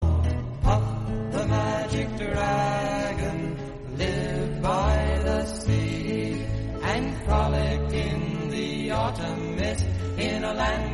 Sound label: english song